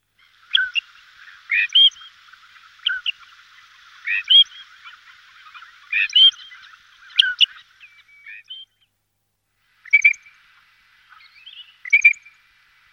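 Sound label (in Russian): Sorokoput seryy, great grey shrike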